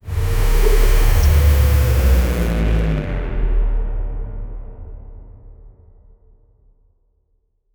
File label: cinematic fx downer sound